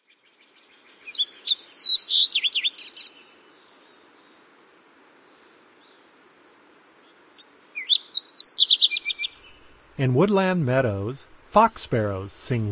Fox Sparrow; North America; Common Western Species; Know Your Bird Sounds

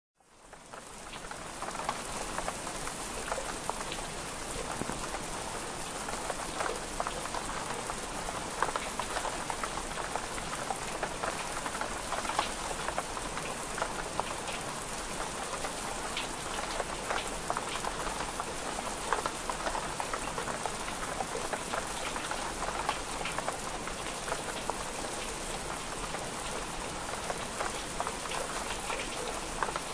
Light Gentle Rain

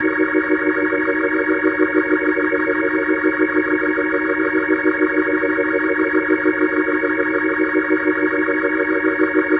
Organ D# Min7; Ambient Cinematic; Organ Licks

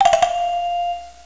toy piano; SYNTH

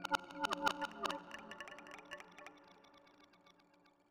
Warp Wave Drum; Ambient Cinematic; Rhythmic Atmospheres

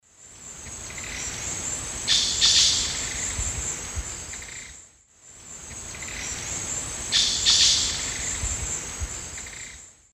Piaya melanogaster, call; S. America; Eastern Lowlands

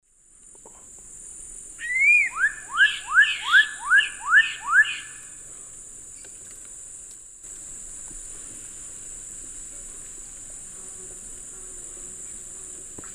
Donacobius atricapilla, calls and duet; S. America; Eastern Lowlands

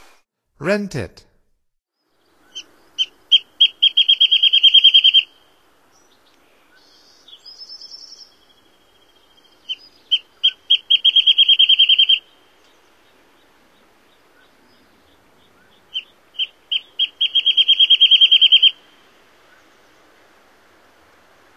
Wrentit, Songs of Birds of North America; Stokes

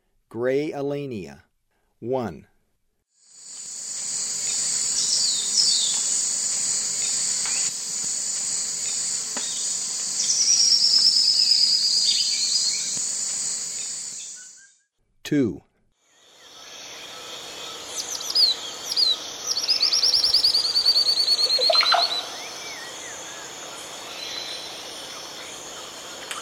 Gray Elaenia; S. America; Eastern Lowlands

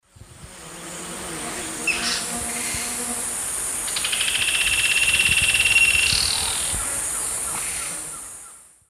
Galbula albirostris, song; S. America; Eastern Lowlands

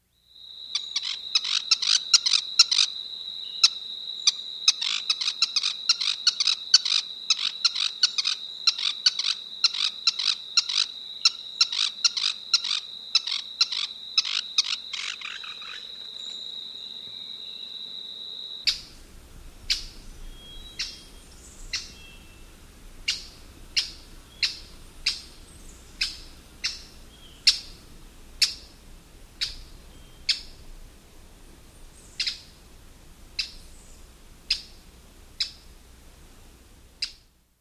Streak-Breasted Treehunter, S. America

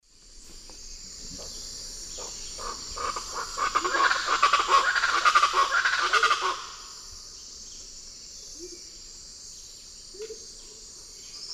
Ortalis guttata, group calls; S. America; Eastern Lowlands

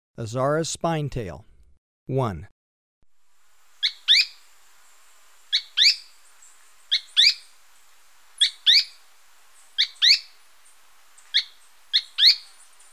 Azara's Spinetail, Birds of Ecuador; S. America

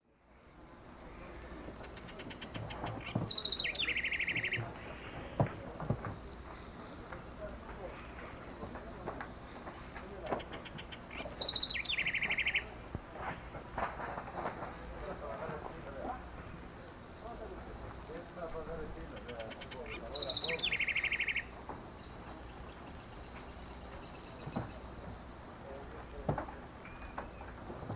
Troglodytes aedon, song; S. America; Eastern Lowlands

